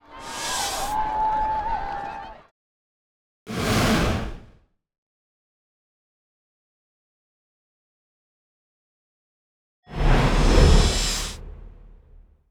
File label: SFX; Everybody Hates Chris; Launch